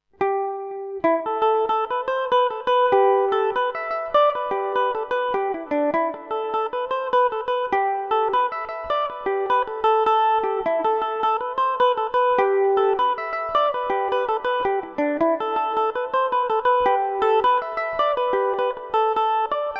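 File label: A dorian revelation, Irish mandolin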